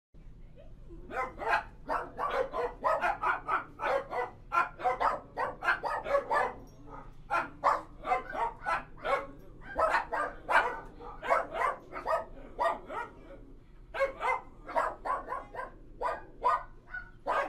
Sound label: dog boarding house; فضای داخلی پانسیون سگها